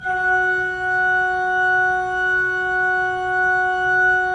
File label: Pipe Mix Celeste, 3D Organs